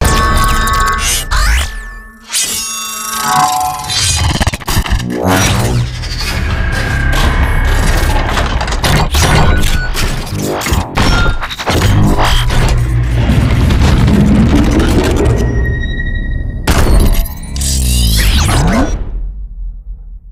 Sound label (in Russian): zvuk transformacii